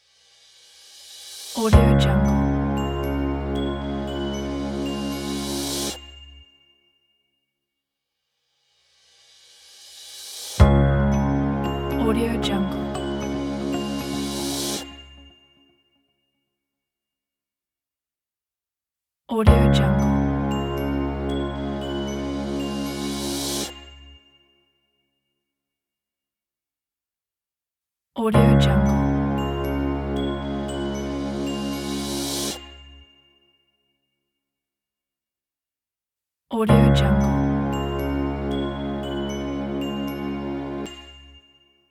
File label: corporate logo pack